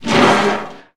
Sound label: Door Rust Metal Stuck; Doors